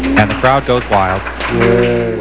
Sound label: crowd wild